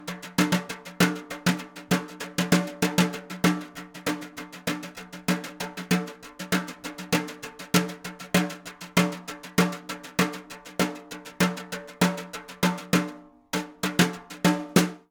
Brushed snare

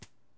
Hacky Sack

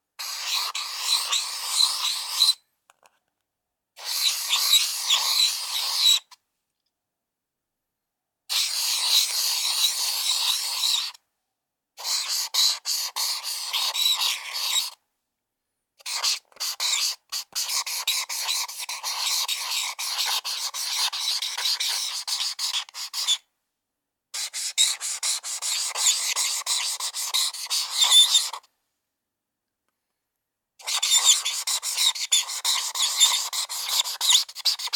Marker On Paper

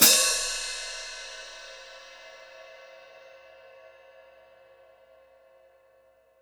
A Custom Hi Hat Cymbals Foot Splash; Zildjian

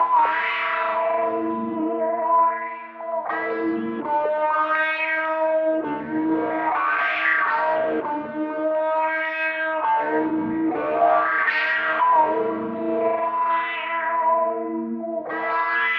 Electric Guitar Distorsion Wah 120

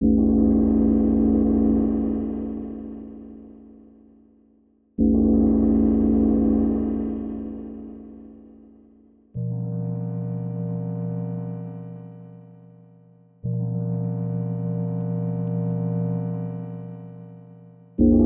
gong

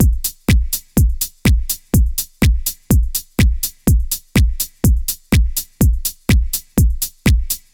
124 Bpm Smooth House Groove

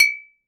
Glass Cling

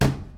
Knock

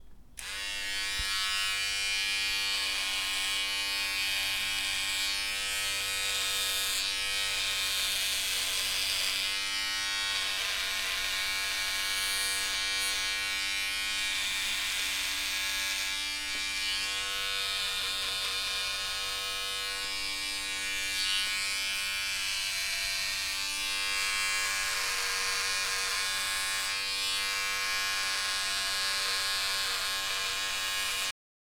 Beard Trimming; Trevor Webb

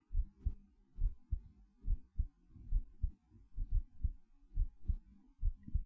Soft And Calm Heartbeat

